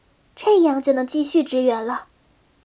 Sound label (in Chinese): 被治疗; BattleCry; 薇薇安